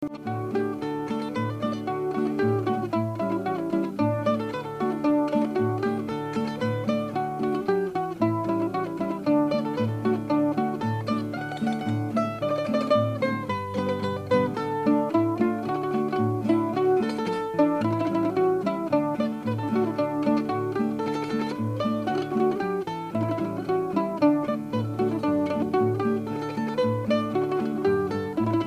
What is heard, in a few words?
Mandolin Lead